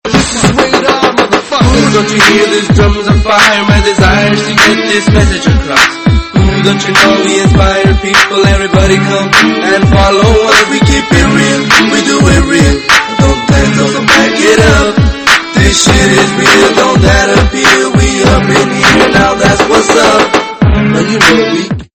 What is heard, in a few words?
Sound of Drums